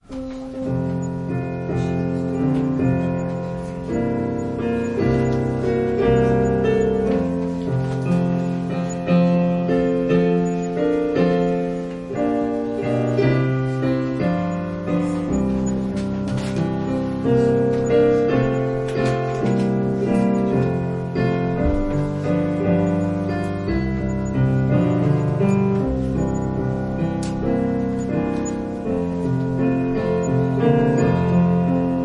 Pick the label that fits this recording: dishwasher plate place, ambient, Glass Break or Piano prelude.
Piano prelude